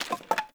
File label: Wood Pickup; Objects; Pickups